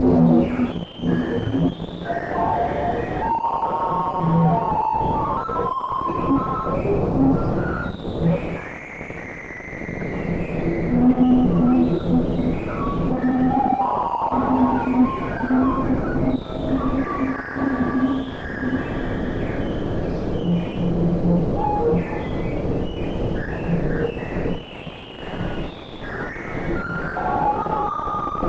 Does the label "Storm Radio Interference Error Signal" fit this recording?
Yes